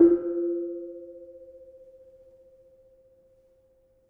Kenong, gamelan

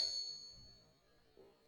Panerus F sharp 6, gravity harps tuned

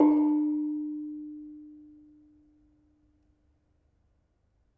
Bonang Barung, gamelan

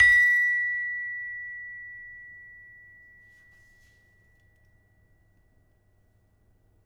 Peking, gamelan; gravityharps; timbrebox

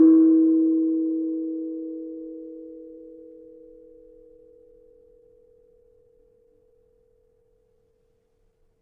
Keno 4E, gamelan tuned

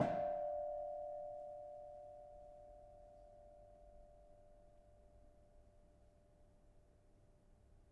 Kenong, gamelan

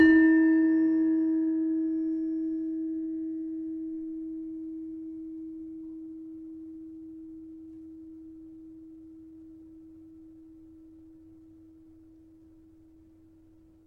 gamelan tuned

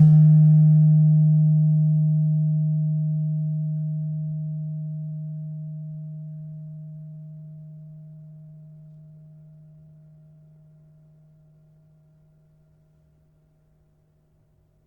Slentem D sharp 3, gravity harps tuned

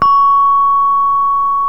hi gangsa mid, gamelan; gravityharps

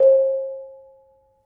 Bonang Barung, gamelan

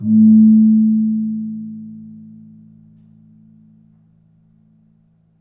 Kempul hard, gamelan

